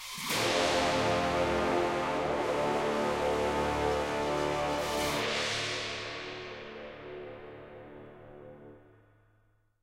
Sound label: Escape Stinger; E01 Forbidden City